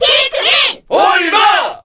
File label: Cheer Korean; Olimar; SSBB